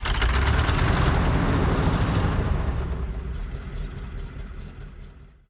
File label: engines; World of Tanks